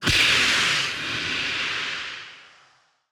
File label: Necromancer Victory Cast; portal quest android